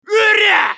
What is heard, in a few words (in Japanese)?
攻撃; ソホ(格闘型)